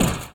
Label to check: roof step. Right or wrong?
Right